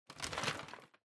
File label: Vacuum Cleaner Noise